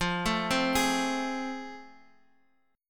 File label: A Minor Major 7th Sharp 5th, F